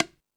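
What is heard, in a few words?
Jungle Percussion, Drum Machines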